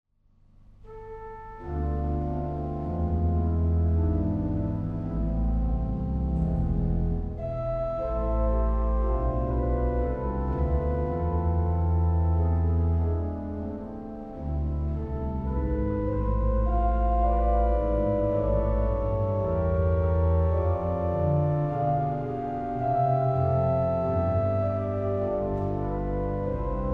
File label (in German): Praeludium, orgel; Aulfingen